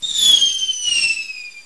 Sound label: fireworks rocket whistle; cstrike; snowwars